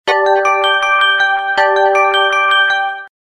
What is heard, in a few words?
Rich Tone, Ringtones; 三星